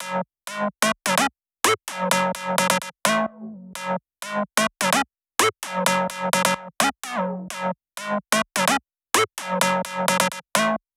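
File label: 128BPM Synth high Add dry SC, F# minor; Vengeance - Essential Clubsounds Vol.5; Demo Constr Kits; Let's Work It Kit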